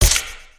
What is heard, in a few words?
brick pickup, ui; aronwk collection; ndaudio